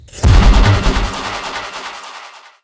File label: Raygun Stinger; Mus Raygun Stinger; Call of Duty Black Ops II; PS3; Wii U; Xbox 360